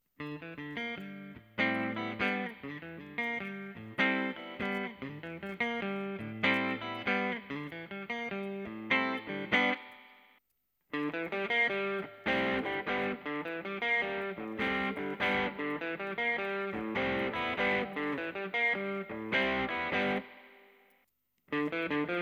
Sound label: ibanez ts9 808